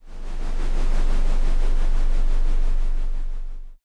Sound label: earthquake